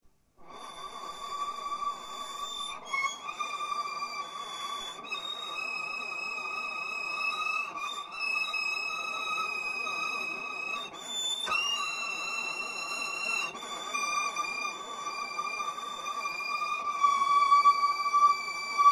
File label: 2 choruses boss then mxr